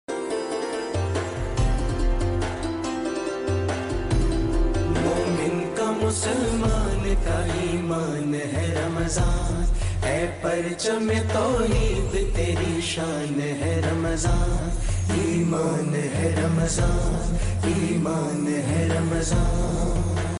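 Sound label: ramadan tune ringtone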